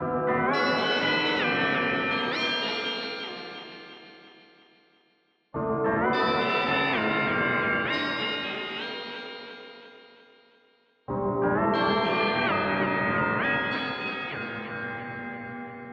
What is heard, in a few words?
bell ambience; DELTARUNE